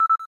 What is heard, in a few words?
cam timer last